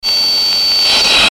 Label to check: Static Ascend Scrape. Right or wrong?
Right